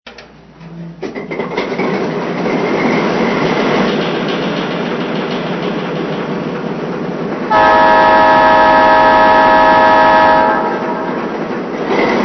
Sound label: Alco RS-3, trains